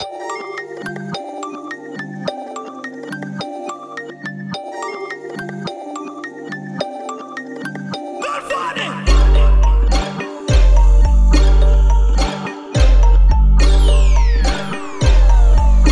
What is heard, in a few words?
JINGLE INSTRUMENTAL